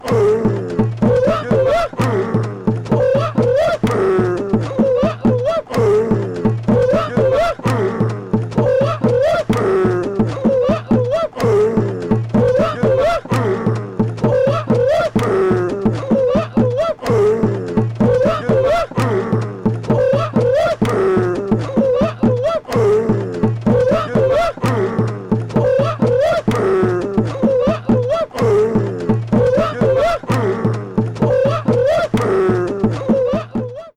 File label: Aaaaahhhhhhhhrrrrrrr (Oooha Ooooha)